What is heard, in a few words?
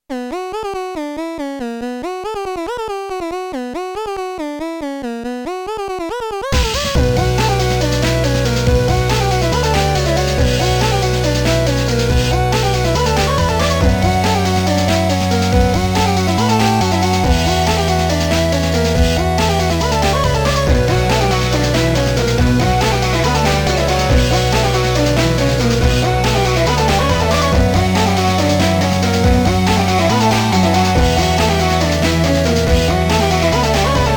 synth